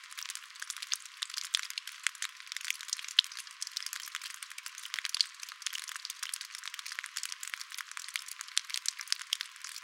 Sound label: water drip; ambient atmosphere